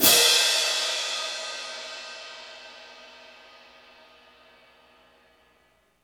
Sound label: CYM ORCH, Orchestral Perc; Roland LCDP03